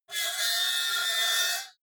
metal lid screech short

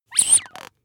bath duck squeeze hard

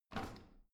washing machine drawer out quick

